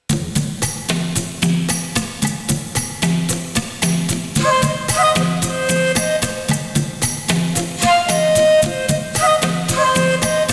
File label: Drum Talk Welcome Drum